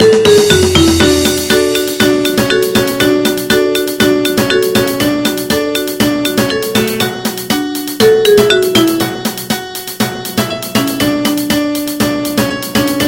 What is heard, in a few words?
Percussion Version